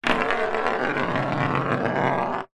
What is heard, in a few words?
foley ballbearing roll across wood surface; zapsplat